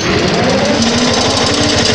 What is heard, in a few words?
digger grinder start; hl2